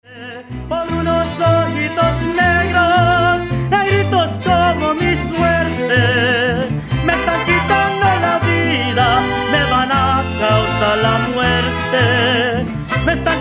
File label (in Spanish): mariachi